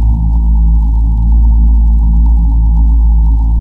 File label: scan loop, Machines